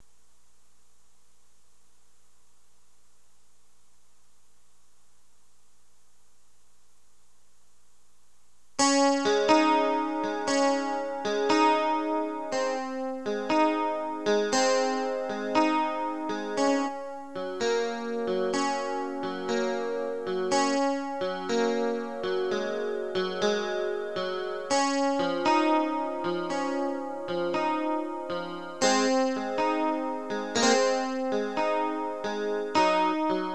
Clean Gtr Chorus Recording

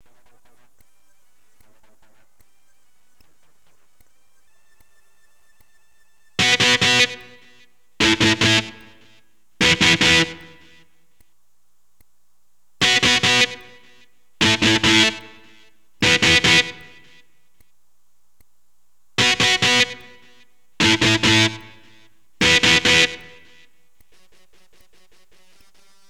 E GTR POW CHORD

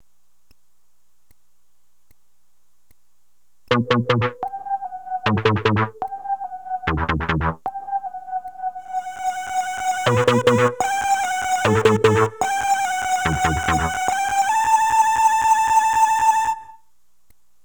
Intro Pad

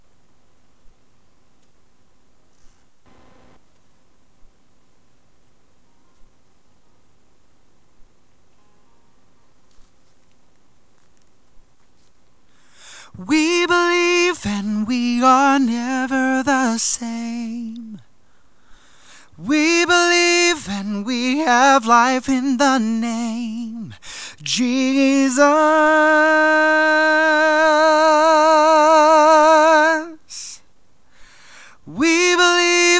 Lead Vox Recording

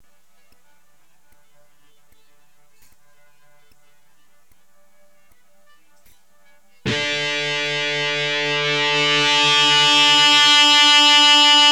E GTR POWER CHORD

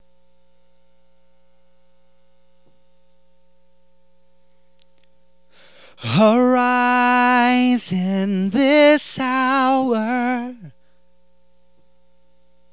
VOX

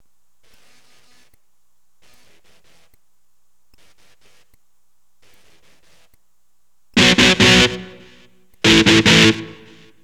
e gTR

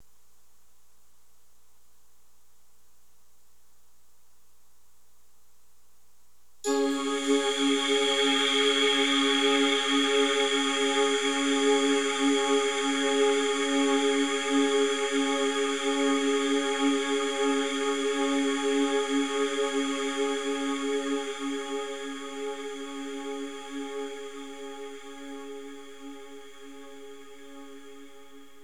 Pad Recording

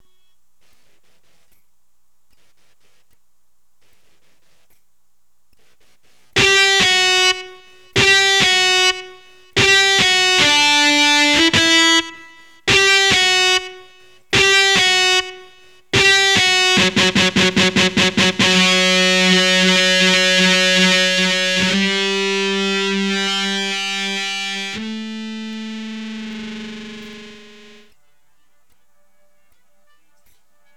e gTR Line

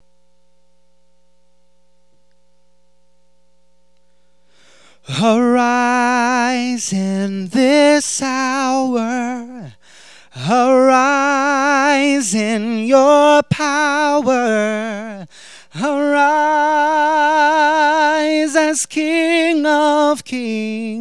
VOX

